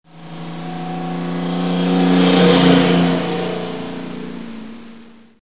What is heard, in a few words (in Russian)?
zvuki pozharnoj mashiny